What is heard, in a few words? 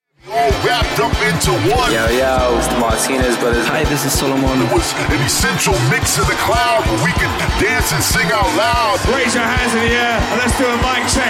machine drum gunshot